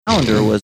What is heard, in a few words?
snorting